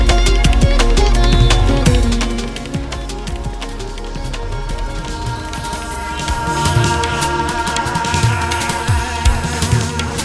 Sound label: low crossfade